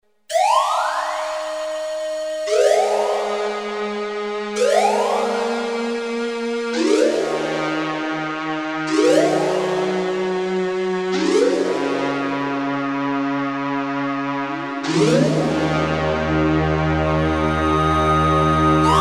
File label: korg m1r synth; synth: whistle; cpu NEC-V50 (part of 70216 family) manages 16 voices polyphony